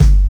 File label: WOUND KIK; Drums In Your FACE!; BONUSKIK